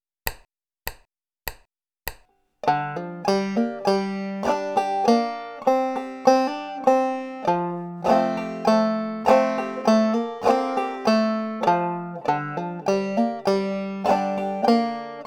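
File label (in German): mit hammer on pull off und clucking, banjo; cold frosty morning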